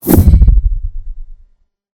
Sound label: item delete